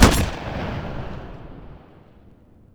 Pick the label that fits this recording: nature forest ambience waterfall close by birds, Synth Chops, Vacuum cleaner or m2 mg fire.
m2 mg fire